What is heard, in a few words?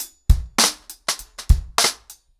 100BPM, ACOUSTIC DRUMS; The Roots Drumloops